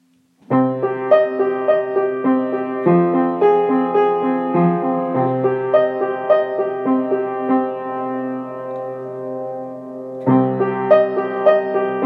Mittag, piano

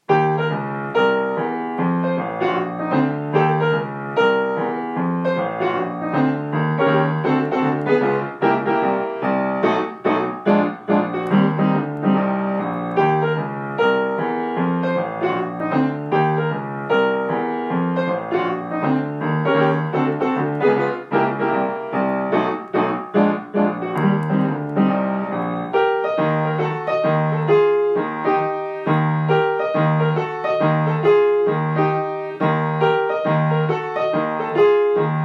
Yamaha, Piano YAMAHA U2

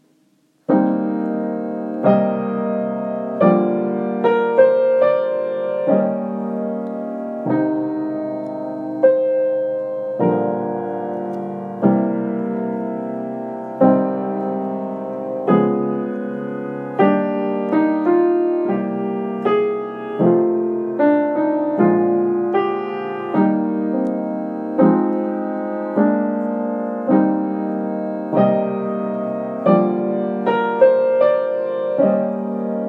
Rameau, piano

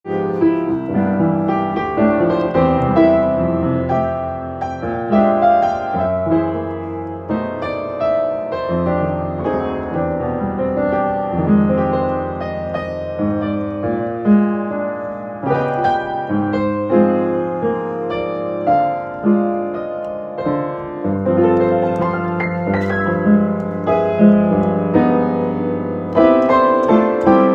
Grotrian, GROTRIAN-STEINWEG; système silencieux; Modèle Friedrich 111 Piano haut de gamme allemand neuf en vernis polyester noir Année 2018

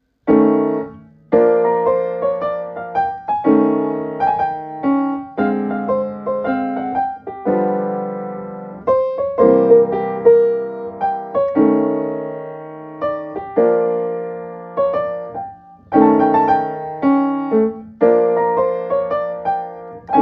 Fuchs, piano d'etude; Fuchs Mohr

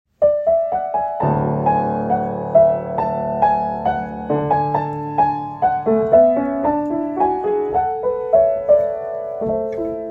Bluthner, Piano BLÜTHNER; système silencieux; Modèle C Année 2011; Vernis noir, 88 notes, 3 pédales dont sourdine

